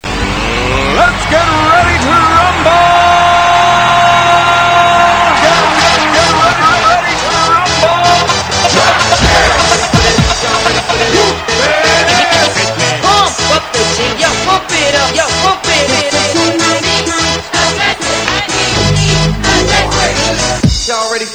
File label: rumble